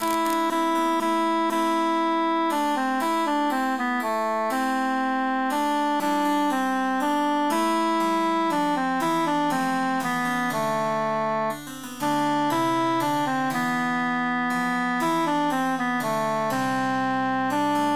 altus emphasized; son me regarde prennes i garde he mi enfant

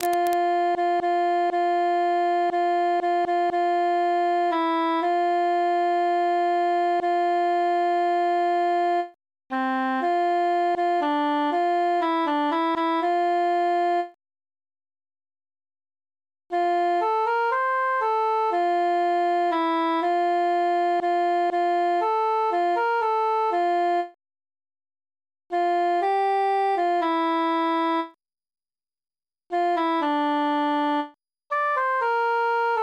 altus solo; fair phyllis

